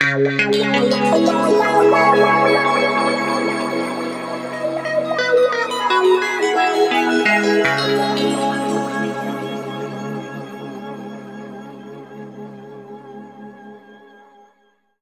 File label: C# P-S WA -L, Guitar Samples; East West; Steve Stevens Guitar Samples Collection; PTCH-SHFT+WA